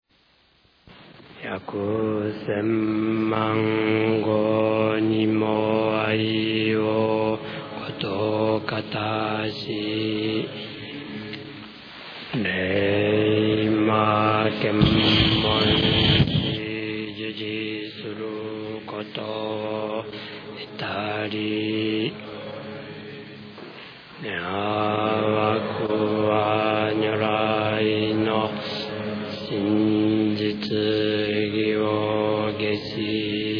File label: chant